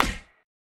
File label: Hit Baseball Bat Human